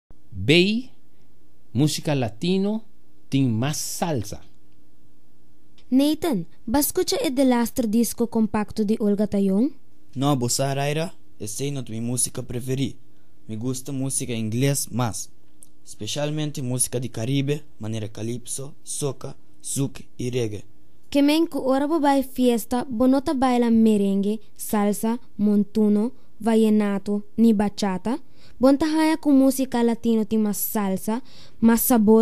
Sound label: Musica Latino tin mas salsa